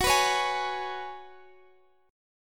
Gb Suspended 2nd Suspended 4th, Guitar, Half Step Down